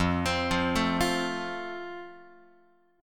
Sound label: F Major, Guitar; arpeggiated